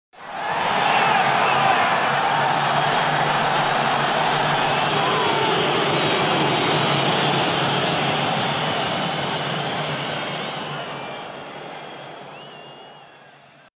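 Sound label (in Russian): реакция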